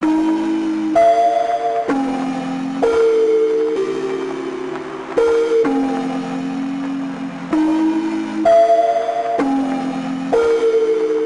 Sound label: Melody Loop Piano D 64 BPM LoFi Ambient